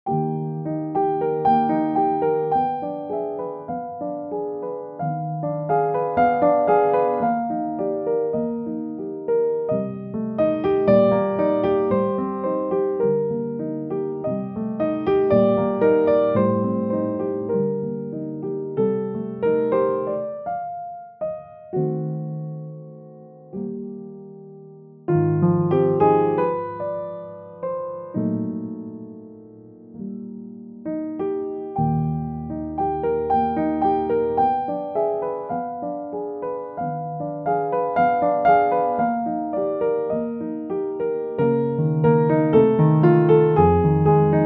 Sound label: Arietta